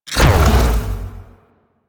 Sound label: Companion Stomp Burst; Warframe; Pets; Zanuka; Abilities